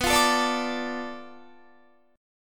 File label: B-Minor 7th Flat 5th B down Guitar; Bm7b5 strummed